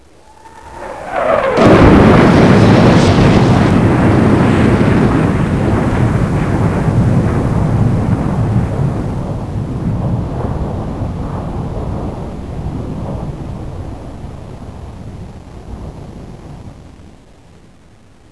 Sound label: flyby